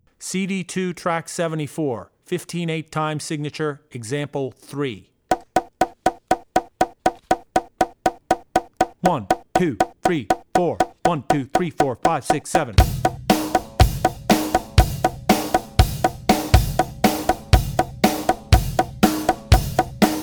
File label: Odd Time 5/8; Drummer's Bible Tracks